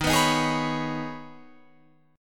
D sharp 7th Suspended 4th Sharp 5th, Guitar, Open F; D#7sus4#5; strummed